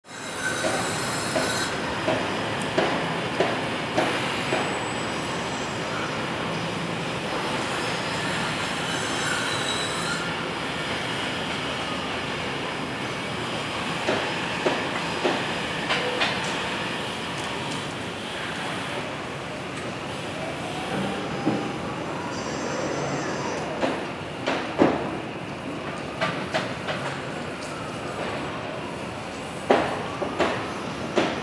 City ambience construction drilling distant

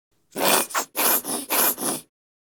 Plastic shampoo bottle squeeze

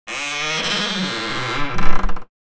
Old scary wood door opening

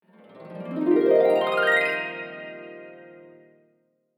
Dream harp

